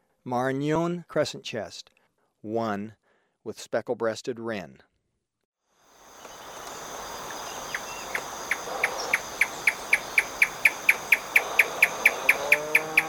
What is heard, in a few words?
Maranon Crescentchest, Birds of Ecuador; S. America